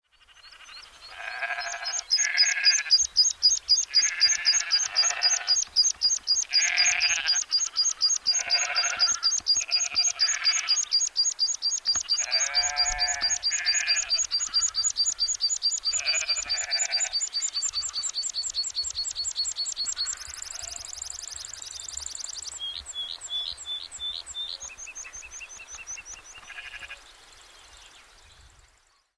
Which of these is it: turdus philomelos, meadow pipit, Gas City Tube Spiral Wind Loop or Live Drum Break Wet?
meadow pipit